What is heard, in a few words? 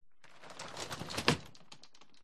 glitched tones household bedroom slide door plastic close shut